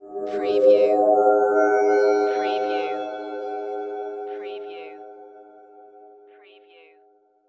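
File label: Production Element Feedback; Sound Effects Bible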